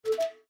Festival Pop-up Blocked, windows 7

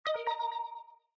Characters Print complete; windows 7

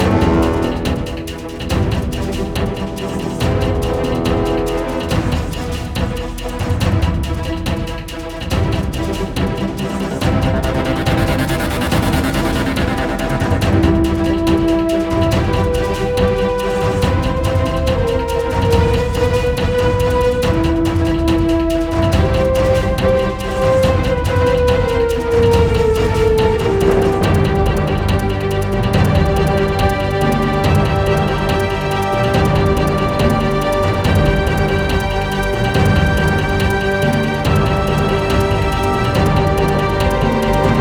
music portal skydive; LEGO Movie Videogame; PlayStation 3

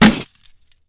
break; Angry Birds Space

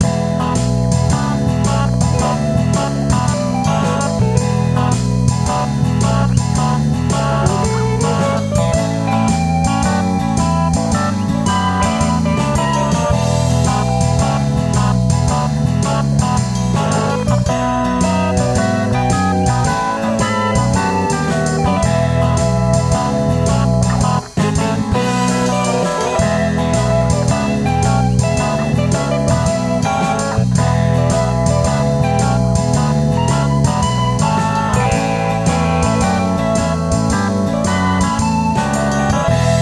Bar Music; Growtopia

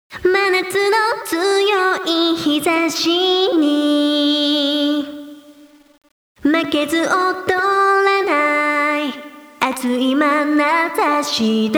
karaoke bgm

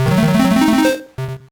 Stage Clear, GBA; Sheriff

